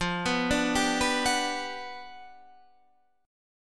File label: G Major 7th, F; arpeggiated; JGuitar